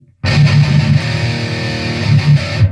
METAL

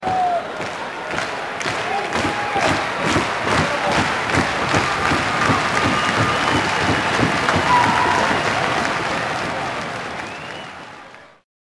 Clapping Sound

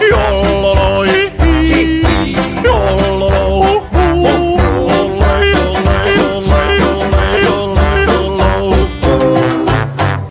Yodeling

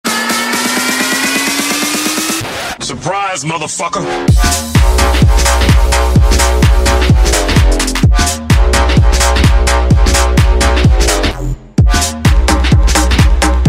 Freaky Bass

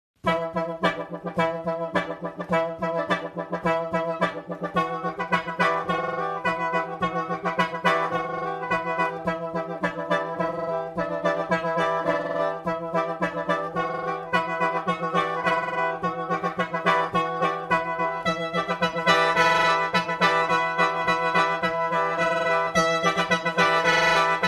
Khaen Rock

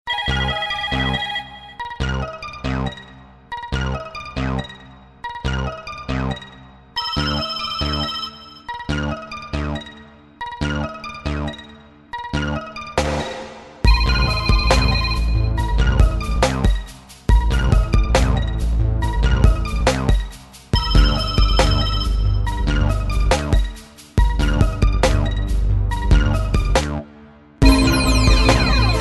Pizzacato

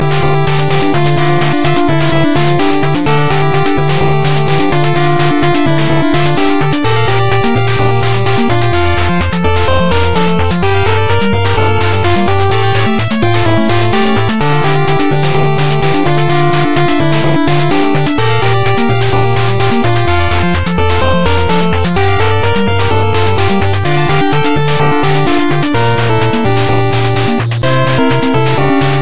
8bit

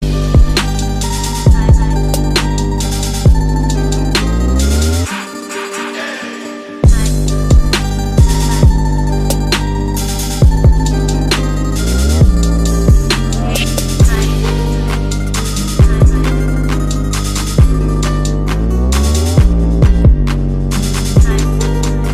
trap remix

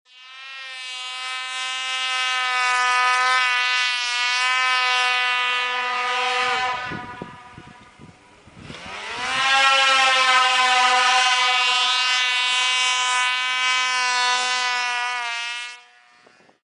Snowmobile

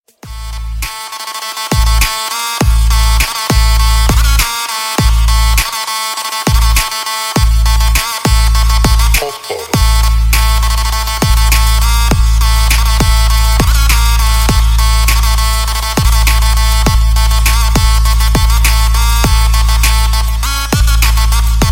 Bas song

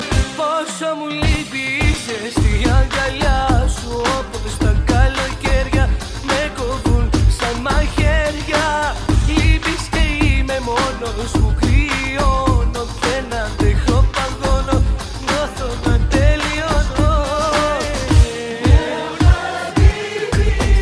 greek

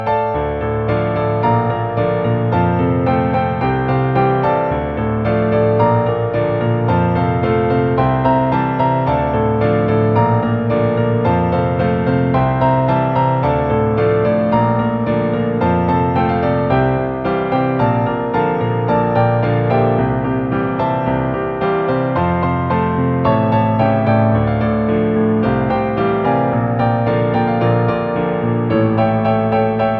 Piano

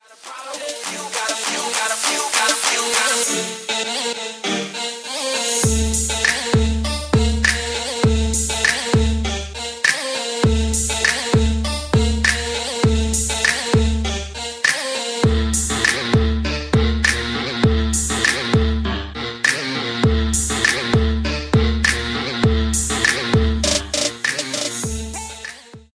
Trap

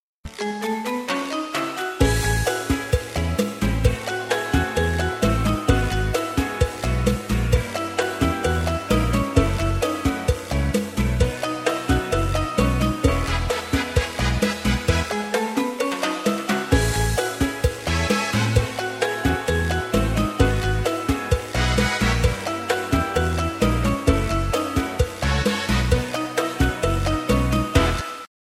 Cha-cha

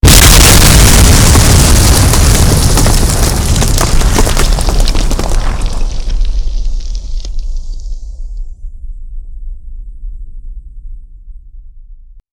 Explosion

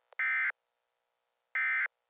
Tone Alert; APX

